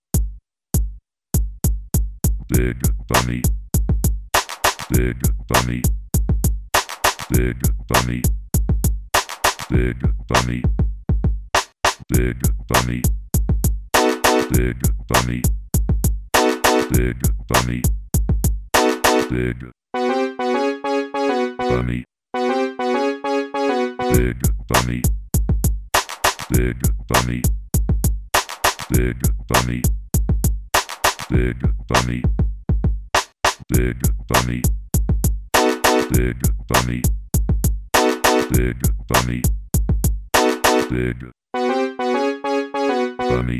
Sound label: tuba